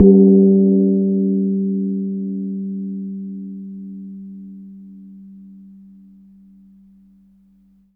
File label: CANANG G3; Spectrasonics; Heart of Asia